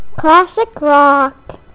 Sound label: Classic Rock